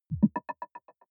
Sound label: button echo marble bubble single; maschinen; signale; soundpool